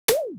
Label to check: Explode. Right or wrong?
Right